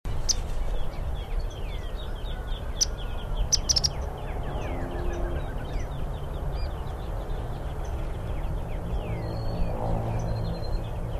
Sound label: Serpophaga nigricans